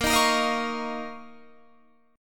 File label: D sharp 7th Suspended 2nd A sharp